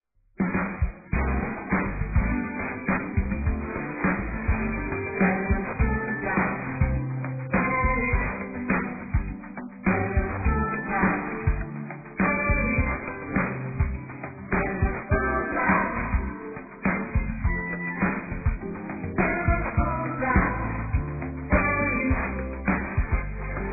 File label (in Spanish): feliz cumpleanos cancion